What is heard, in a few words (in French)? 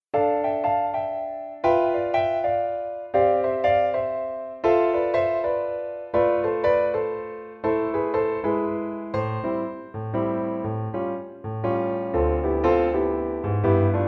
Piano seul